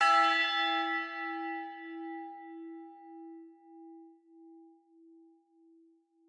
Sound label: bell